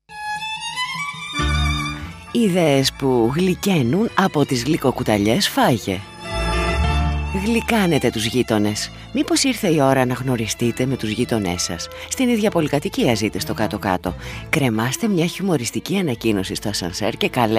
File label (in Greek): melodia; geitones